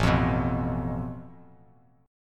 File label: A Suspended 2nd Sharp 5th, Bass Standard; Asus2#5 strummed; x,0,3,4; JGuitar